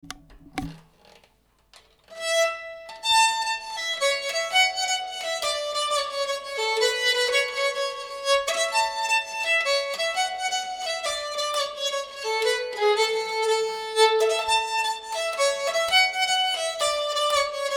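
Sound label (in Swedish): pols från Röros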